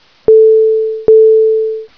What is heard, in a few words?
CHIME; SFMuni